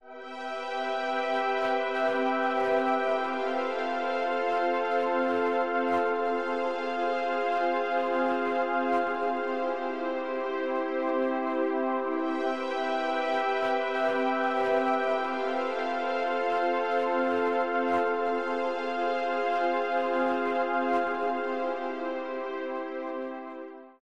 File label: pad mystical soundscape